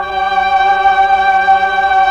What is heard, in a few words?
PAD, Slow Str+Vox; Roland LCDP09 Keys of the 60s and 70s 1